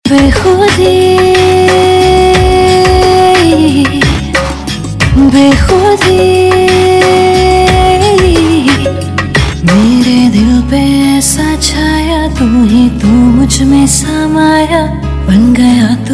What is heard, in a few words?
Female Ringtone, Bollywood